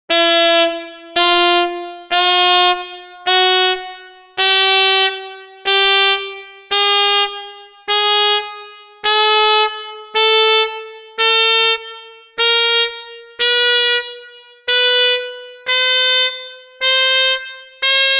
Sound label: quarter up, sax